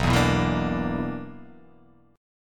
B-Minor Major 7th Double Flat 5th

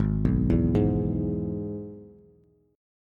Ab Minor Sharp 5th, Bass; Abm#5 arpeggiated; JGuitar

